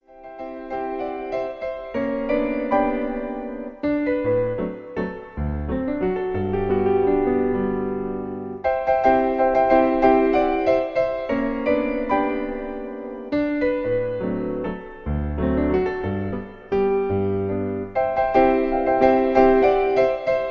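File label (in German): kettenbrückenwalzer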